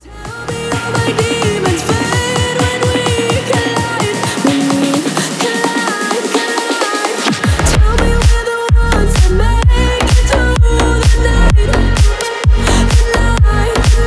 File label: remix